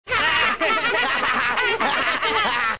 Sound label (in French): Rires; SouthPark